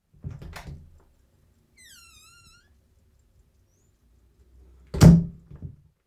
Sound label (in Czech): dveře